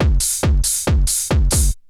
Essential beats; FM 57 - The Ultimate Sample Collection (1997)

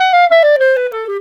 Clarinet; Sonic Foundry (Sony Creative Software); Jazz Solos and Sections

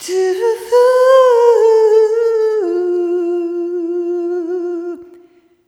Arrhythmic F

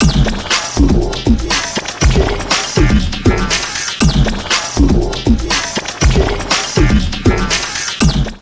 Drum Loops Crazy Processed, 120; USB Soundscan vol.07; AKAI

